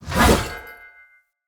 Great Sword Throw; Weapons; Player; Swords; Mendicant Great Sword

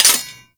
Dagger Metal Impact; Warframe; Dual Daggers; Hit Effects

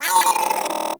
Vocal Pain; Hyena Micro

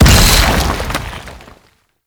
Heavy Hammer Overhead Slam; Warframe; Duviri; Dax; Weapons

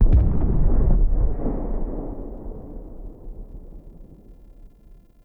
Rock Fall Distant; Warframe; Cinematics; The New War; Lua War Room

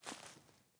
Boot Walk Veg, Footsteps; Characters; Enemy; Boots; Sinecure; Melee Grunt

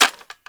Shotgun Reload Handle Foley; Warframe; Zoboz Shotgun Skin

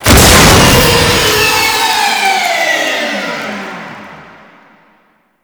Liset Cycle Engines Rand A; Tutorial

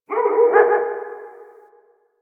Distant Ambient Dog Bark

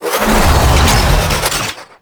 Moon Air Lock Close; Ambience; Gameplay; Warframe; Orokin Moon

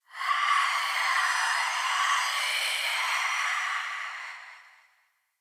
Smithy Soul Breath; Ambience; Soul Plane

